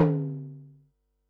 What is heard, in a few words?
tom, Pearl SC-40 Toms